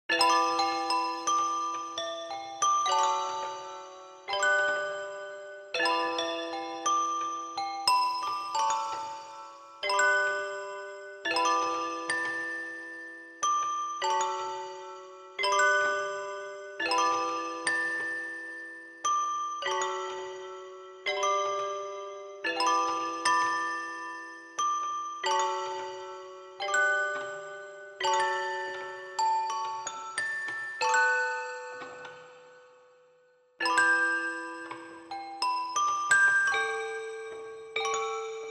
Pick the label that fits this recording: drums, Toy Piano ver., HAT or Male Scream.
Toy Piano ver.